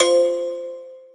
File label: Normal Win; video poker